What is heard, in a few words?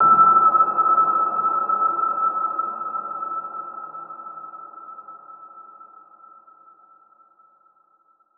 Piano Slam Muffled